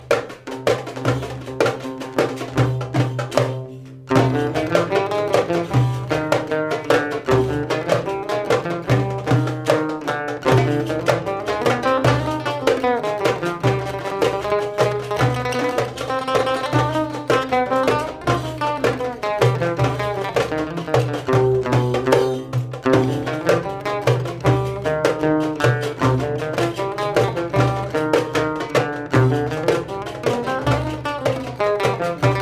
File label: Longa Nahawand